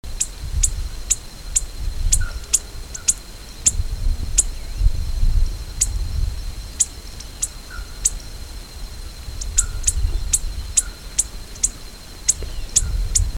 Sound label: Neothraupis fasciata